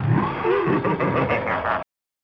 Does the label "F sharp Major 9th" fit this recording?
No